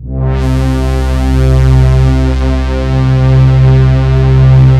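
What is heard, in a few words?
fatty sweep, JV PADS; Pro-Rec - Synthsonic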